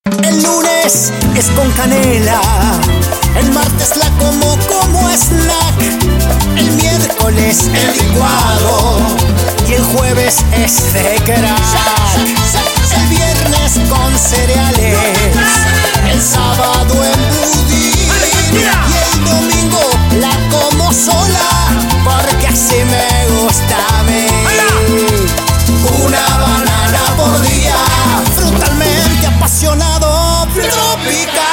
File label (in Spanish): CUARTETO Tropical Argentina jingle